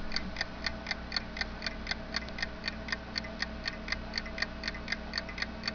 clock tick; orologi

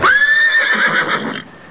cavalli horses; animali

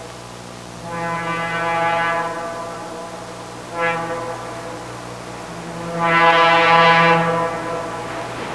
TRAIN; trasporti; treni

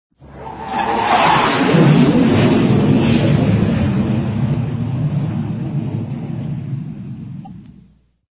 Jet Fly By; trasporti; aerei

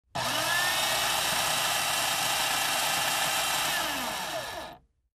coffee grinder, casa

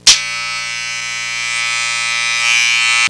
ELECTRIC

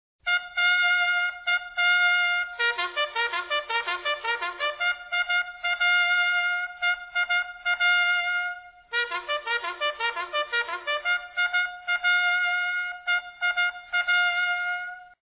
Bugle Call Call To Arms; armi

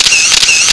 CAMERA

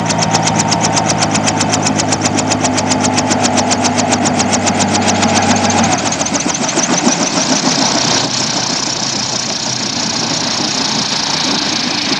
PROPELLR, elicotteri; trasporti

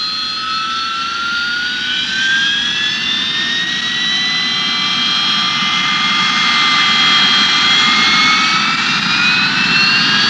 JET FTRA, Aerei; trasporti

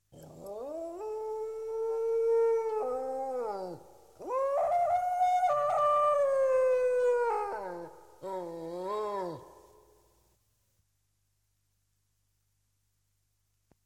lupo coiote wolf

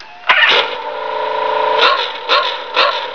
CAR; trasporti; auto

